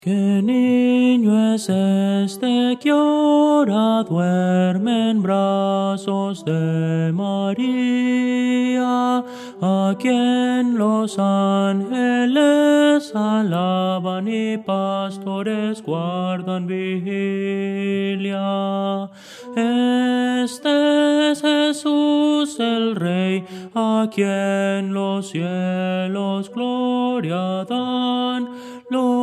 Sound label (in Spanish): tenor; que nino es este